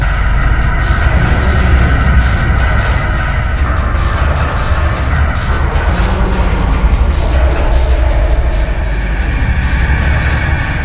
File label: music; tf; slender; mistakemod